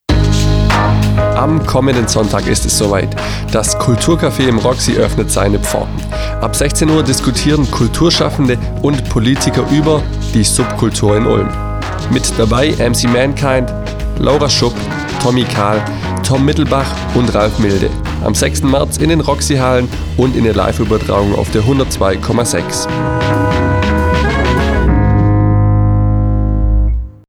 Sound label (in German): promojingle; kulturcafe; kulturcafé subkultur ulm